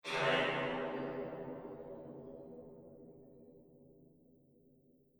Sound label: Distant Impact; Distant Ko Impact; Miscellaneous Impact Sound Effects